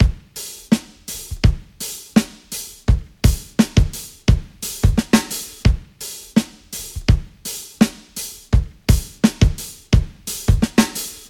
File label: 85 bpm drum loop sample A sharp key, kick tuned to the A# note